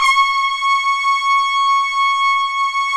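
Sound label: brass, Tpts Swel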